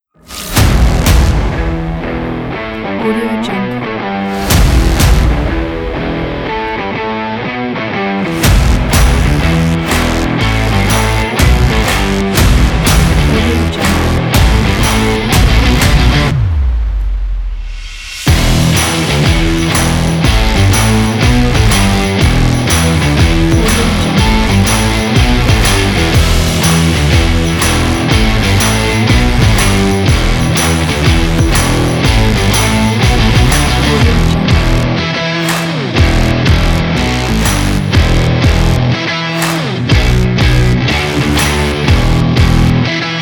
sport promo rock

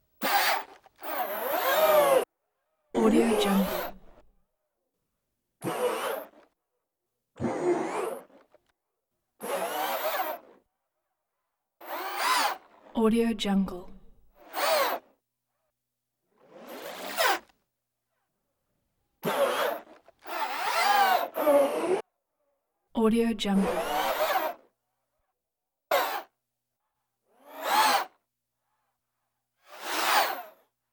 servo short bursts whir various speeds

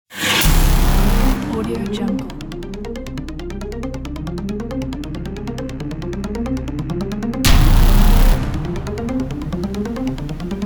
aggressive cyberpunk trailer intro ident

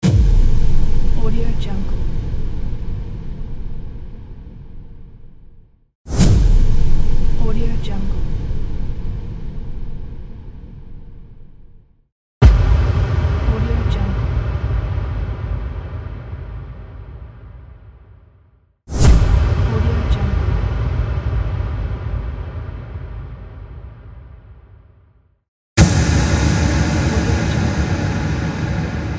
impact whoosh sound; n-audio

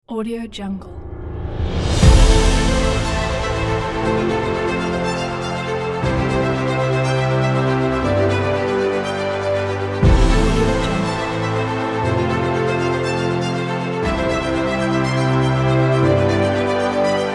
epicness

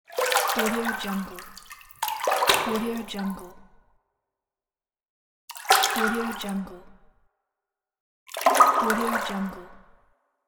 cave water splash

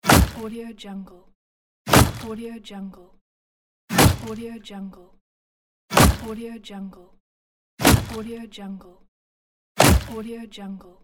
axe chopping wood; gamechestaudio

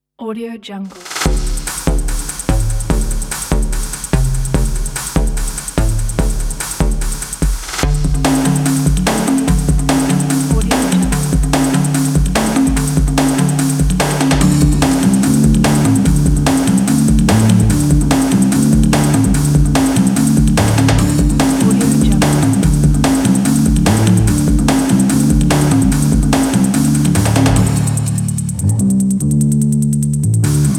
spy espionage action

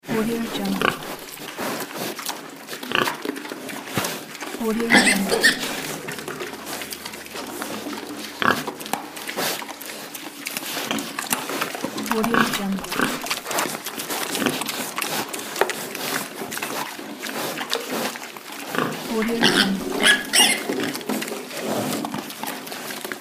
pigs dinner

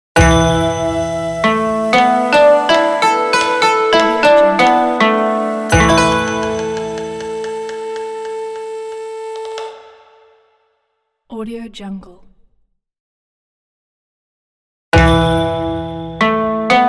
asia traditional logo

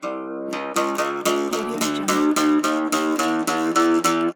old guitar tuning up

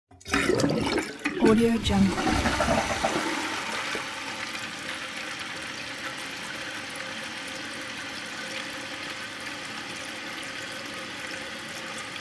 toilet flushes fills tank

